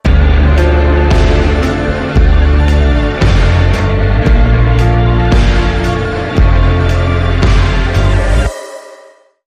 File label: Link Sting